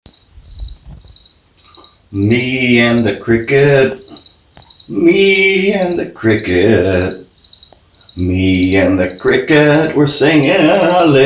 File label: Cricket